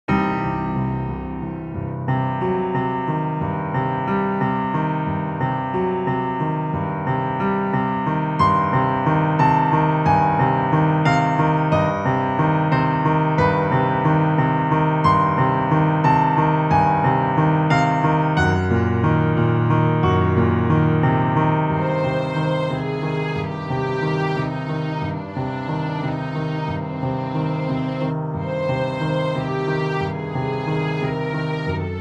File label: static worried piano